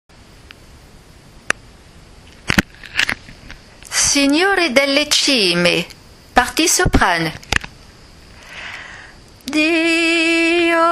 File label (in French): soprane